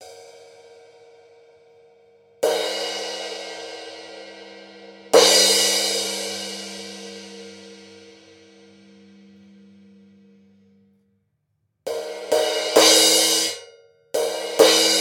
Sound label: Paiste PST8 Crash Hard Softstick